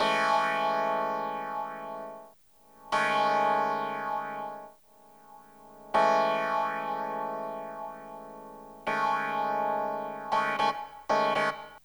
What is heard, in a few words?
SYN, Hip Hop and R&B; Best Service ProSamples vol.02; AKAI